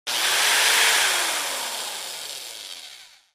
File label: Electric drill being turned on and off.; Tools, Hand Drill Motor, Drill